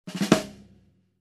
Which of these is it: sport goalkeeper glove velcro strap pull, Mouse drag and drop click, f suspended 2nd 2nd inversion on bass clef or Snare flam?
Snare flam